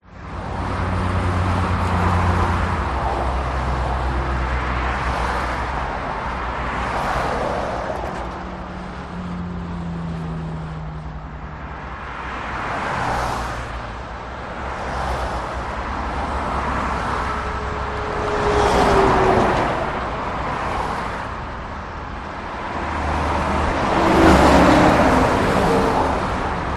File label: traffic motorway cars trucks